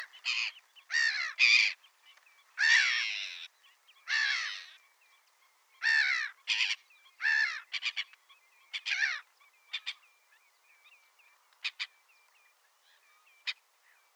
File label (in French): Mouette rieuse Chroicocephalus ridibundus; carnet nature ete